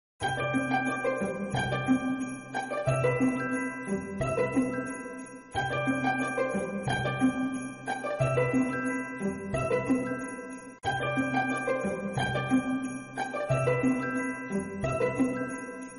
christmas ringtone; discord

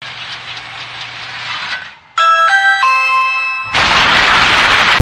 tank blows up ring doorbell

